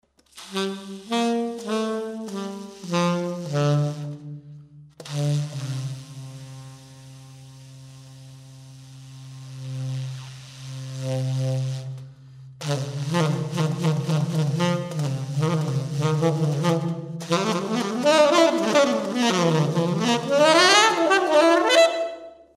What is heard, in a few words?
sample fp, sax